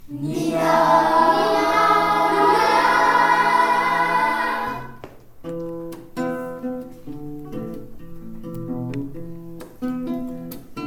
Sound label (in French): guitare montée